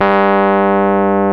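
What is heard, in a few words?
piano Rhodes Mk.V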